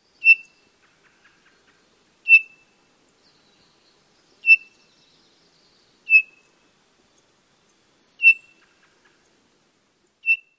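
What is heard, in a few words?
calls, Townsend's Solitaire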